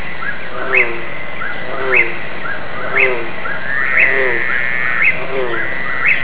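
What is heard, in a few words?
bullfrog; frogs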